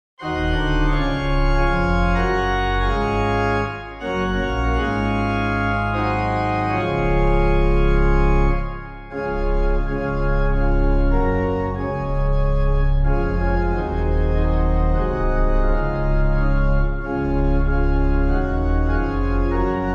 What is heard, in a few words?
Be Still And Know organ